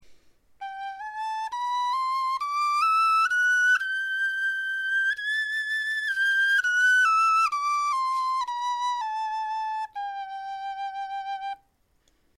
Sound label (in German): tonleiter, knochenfloeten